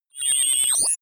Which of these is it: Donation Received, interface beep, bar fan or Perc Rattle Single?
interface beep